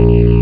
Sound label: Gunner Warn; Grid Warrior 1.2.6 Demo